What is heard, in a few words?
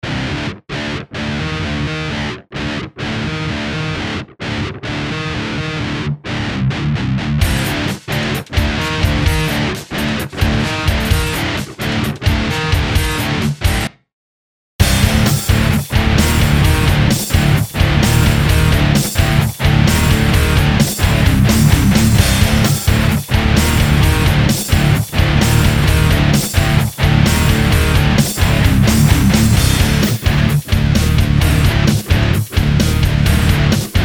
Riff; Луганский Рок